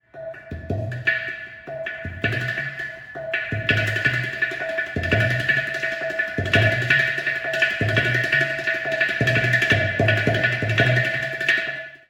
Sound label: ghatam; musique indienne